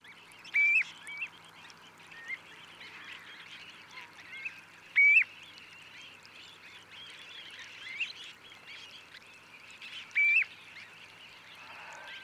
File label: Upward Inflected Calls